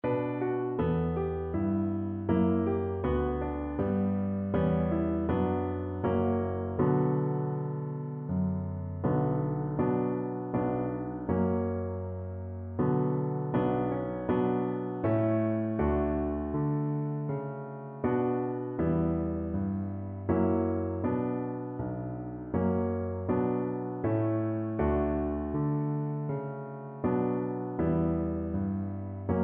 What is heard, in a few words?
oyfn pripetchik viola